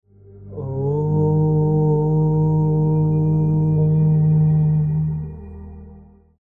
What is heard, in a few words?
small om chant